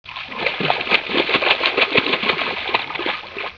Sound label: splash